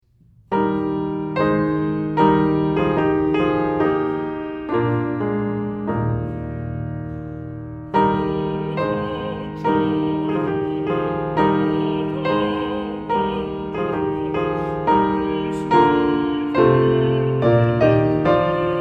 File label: Piano; Oh Thou Joyful Oh Thou Wonderful; piano accompaniment pieces